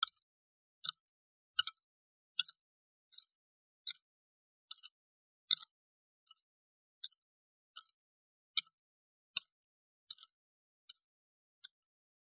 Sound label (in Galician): sachando na hora